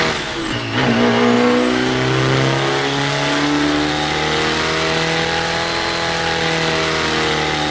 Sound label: third, vehicles; ram